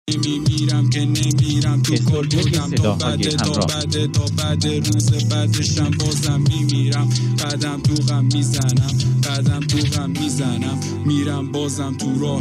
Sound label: mix master rap